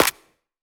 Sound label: Claps; Vengeance Samples